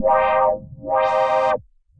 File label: Vinyl, Synth Lead; Vinyl Never Stops; Ableton; Factory Packs; Punch and Tilt